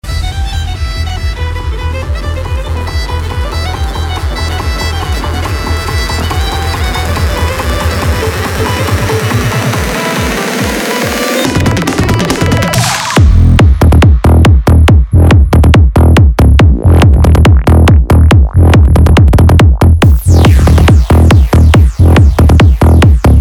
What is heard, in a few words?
BASS CLUB; avtozvuk